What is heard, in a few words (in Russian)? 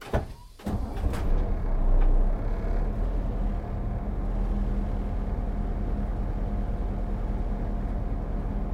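глухой отдаленный странный звук заведенной машины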